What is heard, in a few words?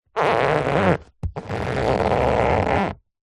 household leather seat cover squeak rub movement hand